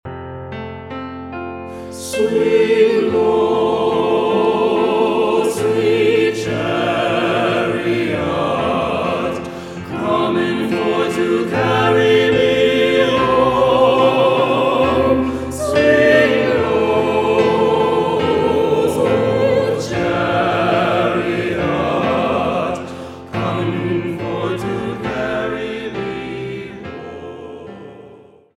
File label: swing low spiritual